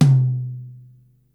tom low, Yamaha Motif ES-Series; 300 Drum Machines

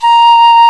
piccolo A#1; E-MU Formula 4000 Series Vol. 5; Protozoa; Proteus2 Presets

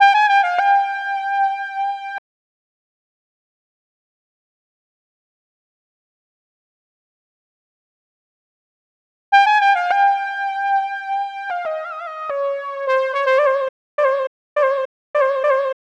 Keyboard

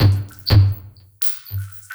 Structured Rhythm, IDM Rhythms

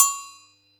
Yamaha EX-5

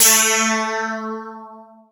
technosyn2, Techno Trance; Ueberschall; SYNTH

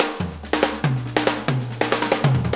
Shuffle Fill, Drum Tools; Sonic Foundry (Sony Creative Software); Loops & Samples Drum Tools; Shuffles; Fills

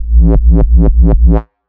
BASS 100 (A); neuro; Stanza

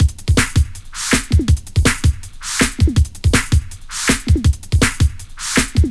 Electro, Loops, breaks; m8 breaks

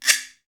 Guiro Wood; Club-50 - Foundations Roland

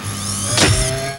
ELECTRONC, HYDRAULICS; Northstar; The Wizard VOL-1

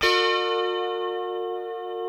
E 4 C funk chord, guitars; Spectrasonics; Hans Zimmer Guitars VOL-1; 90's Sample CDs

